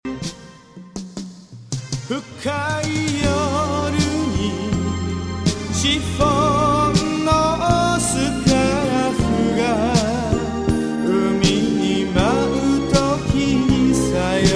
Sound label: countdown